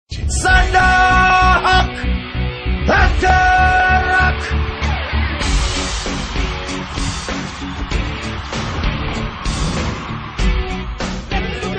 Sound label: guitar, rock; ringtones